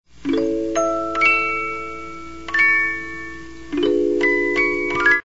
3 Parts, music boxes, 72-Note Movements; Clair De Lune

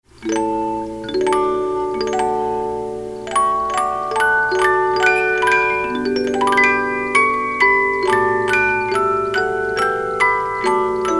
Traumerei, 50 Note Movements

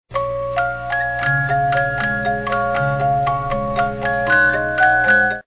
music boxes, 50-Note Movements; Blue Danube Waltz

